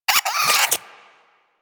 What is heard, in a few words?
Vocal Attack Short, Hunting Critters; Warframe; Cryptilex